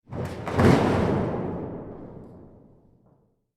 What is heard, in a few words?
crash impact sweetener